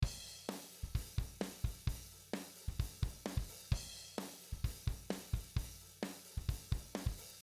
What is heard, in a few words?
drum comp